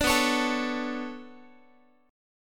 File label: B-Minor 9th B down Guitar; Bm9 strummed